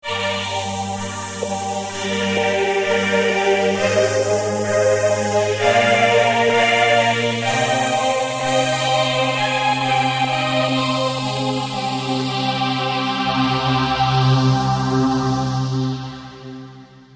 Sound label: Notch Band LFO Pad